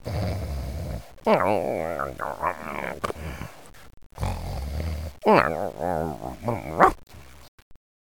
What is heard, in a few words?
Snore; Odie